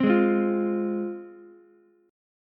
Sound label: G sharp 6th, Ukulele; strummed; 5,8,8,8; JGuitar